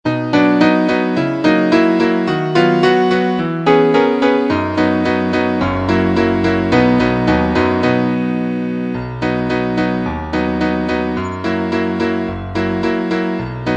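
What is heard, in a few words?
accompaniments, Trumpet in B; Vocalise #8; concone; Rudimentary Transposition Studies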